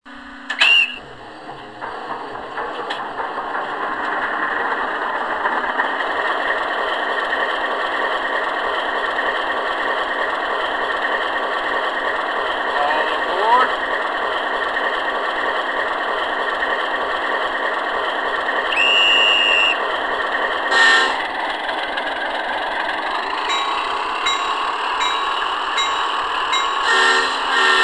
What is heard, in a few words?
Alco 535 USA